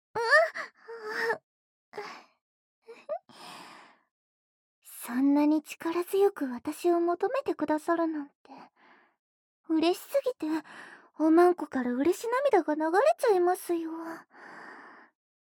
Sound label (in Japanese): 家畜のオホ声雑魚雑魚おまんこご奉仕セックス 耳舐め; ぐっちゅぐちゅ耳舐めver